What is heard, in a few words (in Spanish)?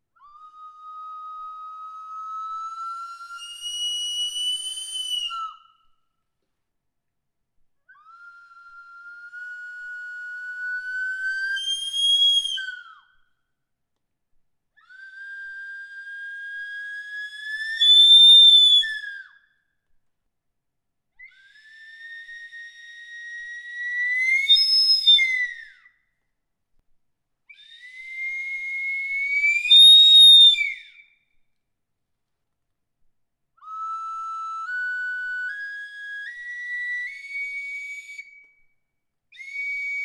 flauta tubular con pabellon fitomorfo